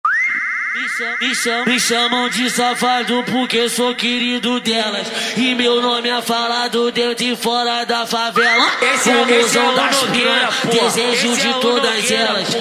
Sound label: especial funk